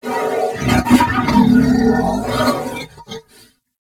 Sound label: Horse Trot Breath; Warframe; Duviri Horse; Vehicles